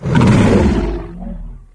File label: chatter; cyberdemon